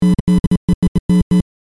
Buzzing bursts speakers malfunction; headphones and speakers

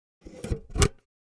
Metal trash can lid closing

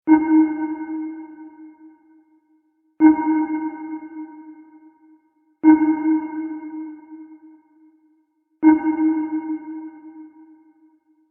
Submarine sonar pinging scan echo; vehicle

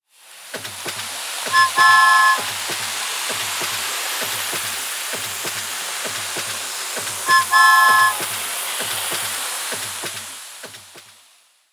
Toy train movement whistle blow; rc toys

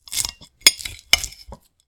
Dinner or lunch scraping the bottom of the plate

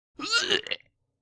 Guy throw up

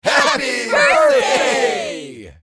Mixed crowd happy birthday; celebration

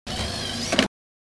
DVD player tray open or close

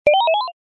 Puzzle game level up

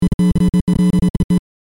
Buzzing bursts speakers malfunction; headphones and speakers

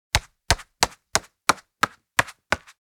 Monopoly board game token moving

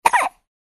Newborn baby hiccup

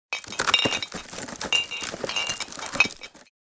Wicker basket movement bottle clinks inside

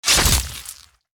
Werewolf claw slash strike

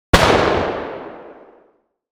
Shotgun fire distant perspective

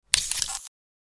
Night vision goggles putting on; military equipment sound effects

